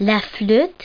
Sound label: FLUTE; FARM; singvox